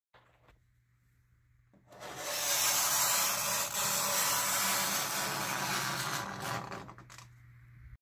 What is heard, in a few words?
nails on wall; ناخن کشیدن روی دیوار